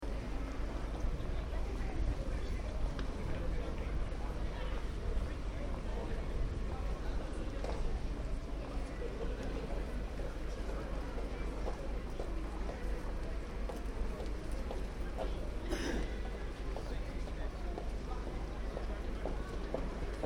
Town (Small) Ambience, People, Slight Traffic In Background; Exterior town; Outdoor; Stuart Duffield